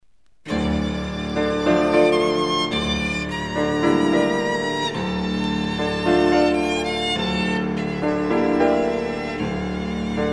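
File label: piano trio; novak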